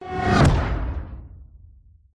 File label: whoosh; Audio Mangler